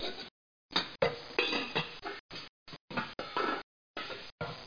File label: pots pans; 10,000 Graphics Pack Volume 1; Media Graphics International